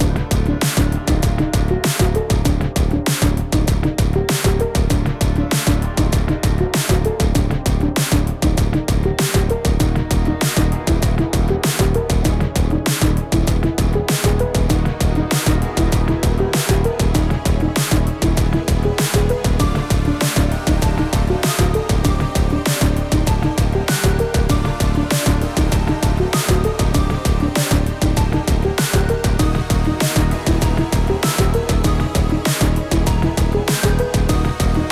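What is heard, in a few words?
background music field desert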